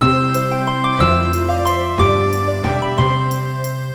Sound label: celtic, ringtones; Google; NewWave Labs